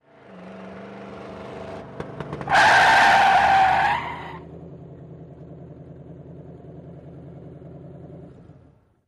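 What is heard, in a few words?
Car Skid Fast With Sputter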